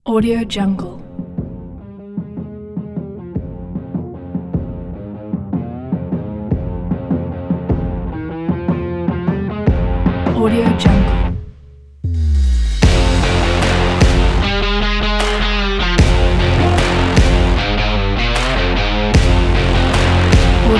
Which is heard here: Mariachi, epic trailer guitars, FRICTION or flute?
epic trailer guitars